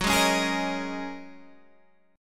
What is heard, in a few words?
G sharp 9th Suspended 4th F sharp